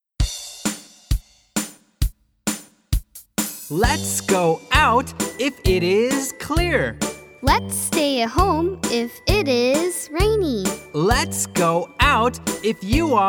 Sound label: chants